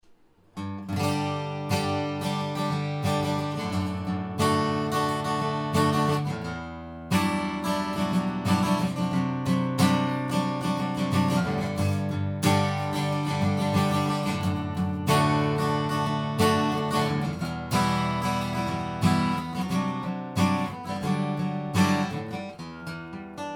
yamaha guitar

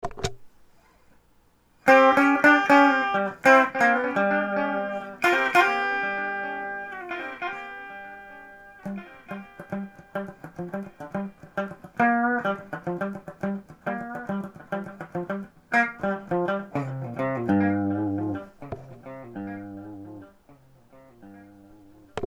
delay boss dd5, guitar

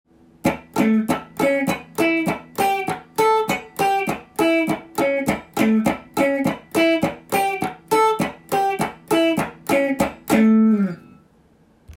chord tone cutting

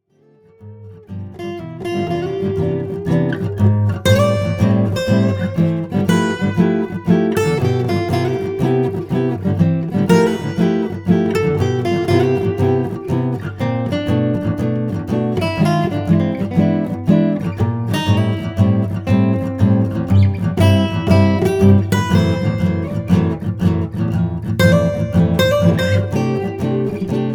guitar

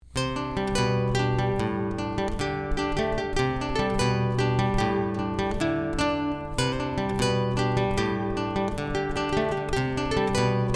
bgm guitar

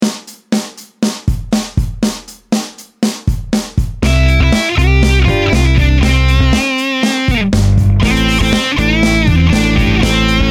hamori guitar song

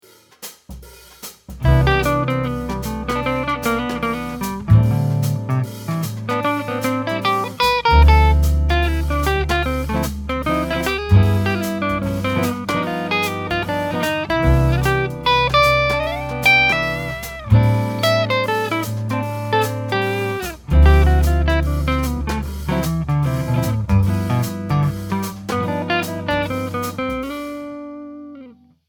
solo

